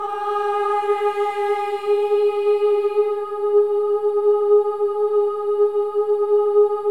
AH EH; Spectrasonics; Symphony of Voices; AH-EH-EE

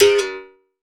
Berimbau Down; Roland BK-5; Percussion

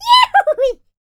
yodel yell; World Voices; ILIO; Vocal Planet

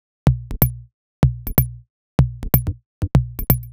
Top C# 125bpm, House Drumloops; Zero-G House Fabrik; 90 s Sample CDs

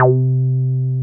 303 RES C4M, RES BASS

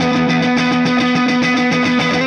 Clinical Distorted Funk Guitar

